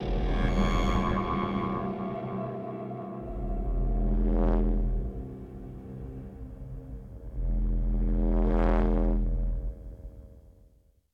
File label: Music Stinger Reveal